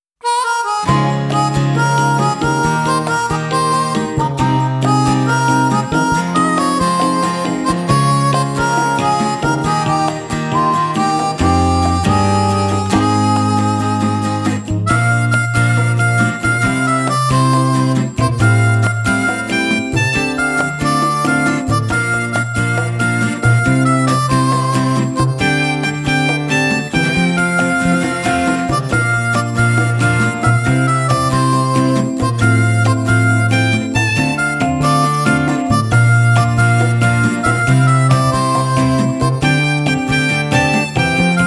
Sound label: Acoustic Version; Mahou Sensei Negima